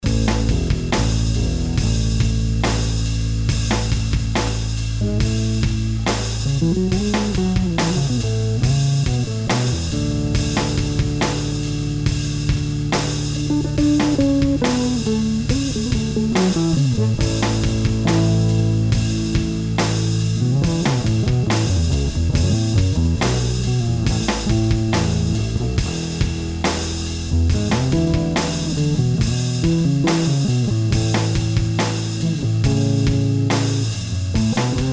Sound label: 8 string passive mode neck pickup fingers, Bass; ShamrayBass